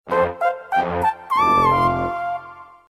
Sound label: SMS ringtone, notifications; Rage 35GN